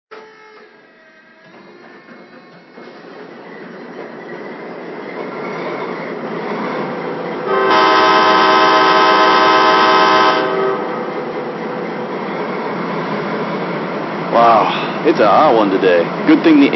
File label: Dash-8